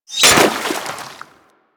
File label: Kunai Impact Water; Warframe; Hit Effects